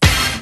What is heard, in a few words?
Big Hit, Drum Machines; EMU Planet-Phatt